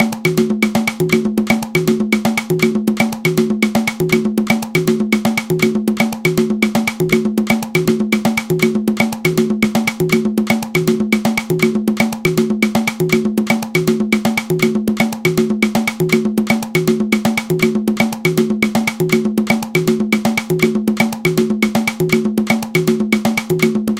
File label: columbia habana